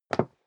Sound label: step leather shoes wood parquet single stair down; schritte